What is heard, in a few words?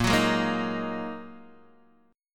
A sharp 7th Suspended 4th Sharp 5th, Guitar DADGAD chord